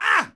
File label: Knight Hit Vox; Civ4 warlords